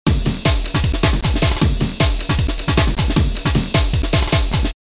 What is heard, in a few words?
Sample Loop